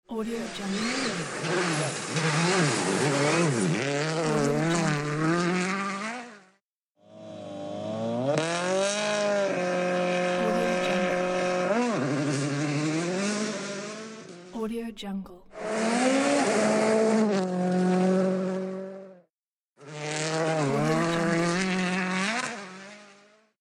racing cars sound

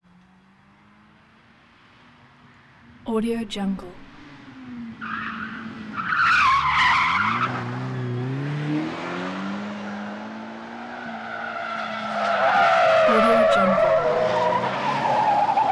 ferrari approach in fast turn with skid

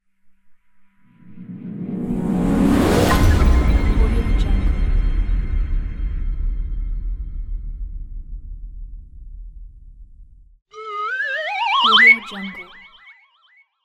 cartoons sfx big pack

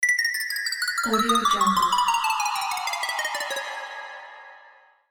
cartoon stairs falling

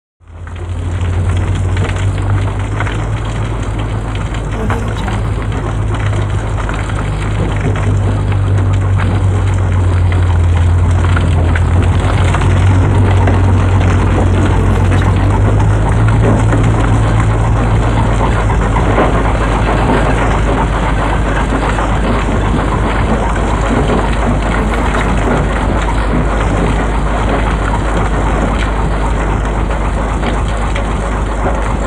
stone crusher working with clanking stones

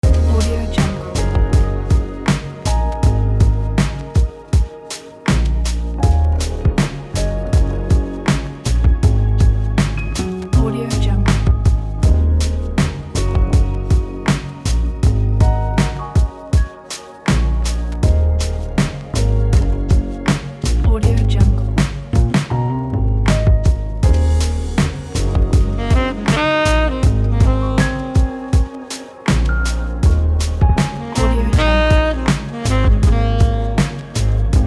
lounge loop; hotloops

